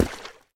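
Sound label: step water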